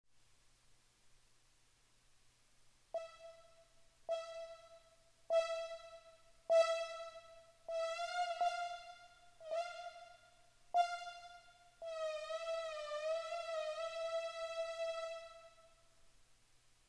Instrumental

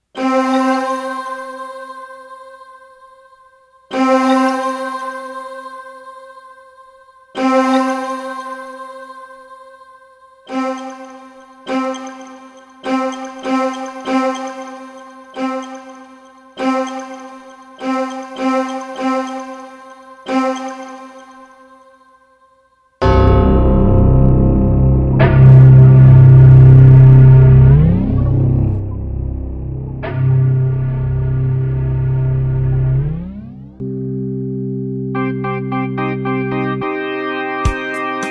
Mountain Music Keyboards

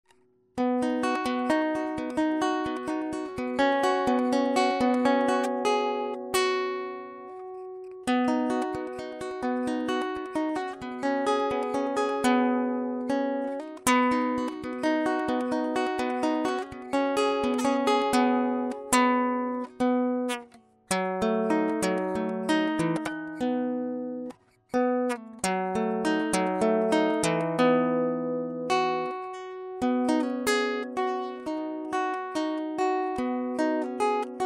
Finger Picking Interlude

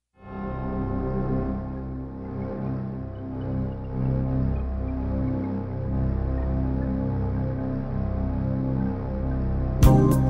Mountain Music Keyboards

